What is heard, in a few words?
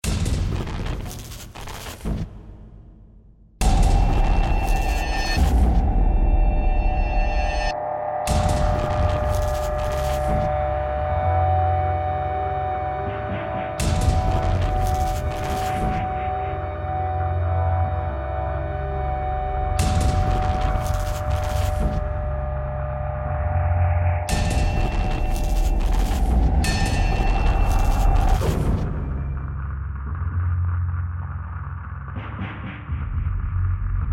Pulse of Thunder; sh origins